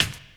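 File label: snare drum, Drum & Bass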